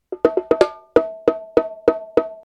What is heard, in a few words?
bongos and congas; percussion loop